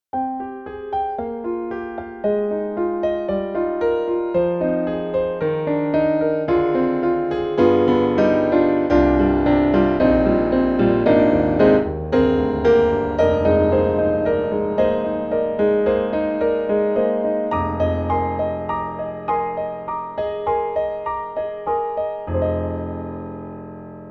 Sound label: Roland SRX-11 Classic Grand, XV-5050